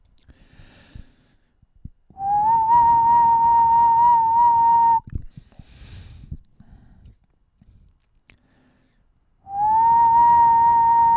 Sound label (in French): sifflement; creer un instrument a partir d un echantillon